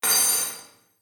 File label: Diner Dish Walla